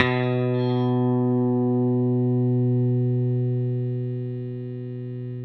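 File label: electric guitar sus B3 left; Sampleheads; Whole Lotta Country 1 & 2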